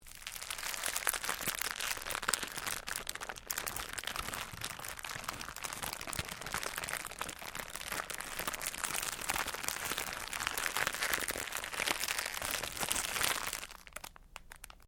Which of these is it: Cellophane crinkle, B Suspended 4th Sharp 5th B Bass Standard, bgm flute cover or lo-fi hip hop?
Cellophane crinkle